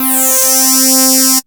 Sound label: SWEEP C3; Best Service Alpha Dance vol.2